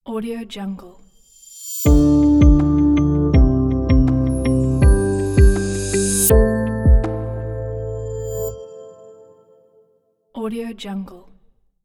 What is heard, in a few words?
smooth intro logo